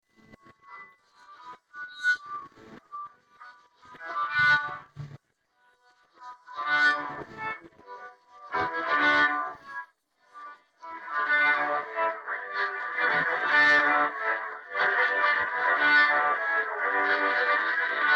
guitar

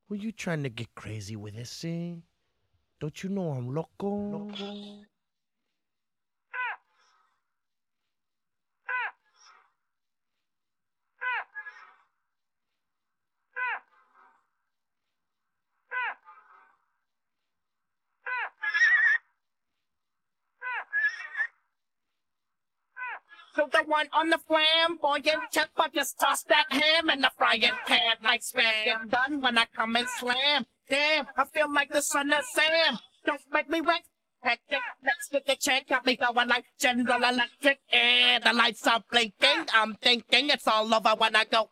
vocals

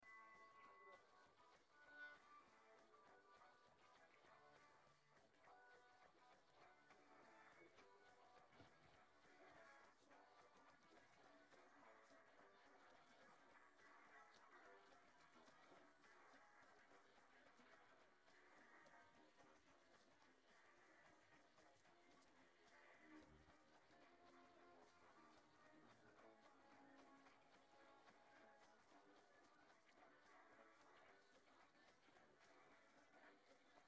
piano

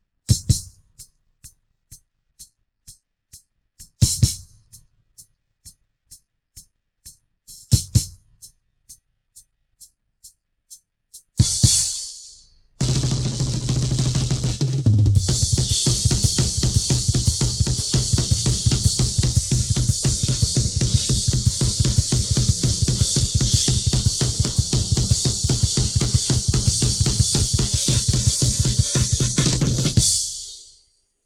drums

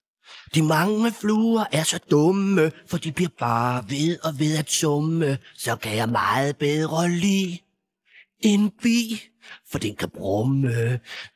vocals

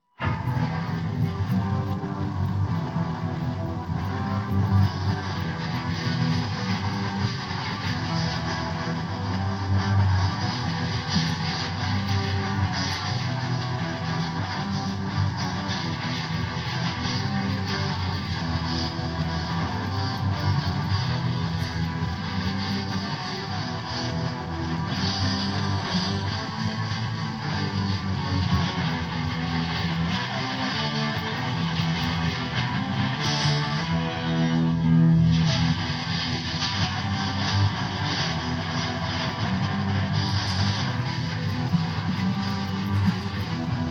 guitar

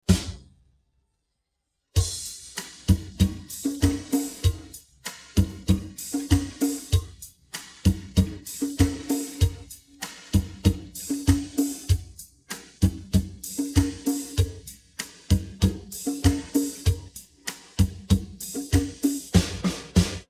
drums

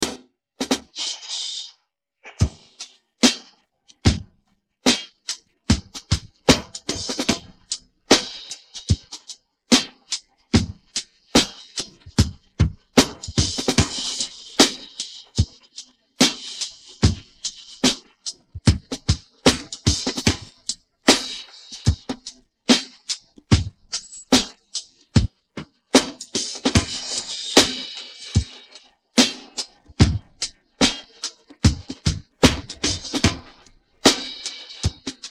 drums

